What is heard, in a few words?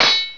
Sword Clang; Castles - Siege and Conquest